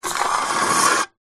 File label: food drink can soda empty scrape wood floor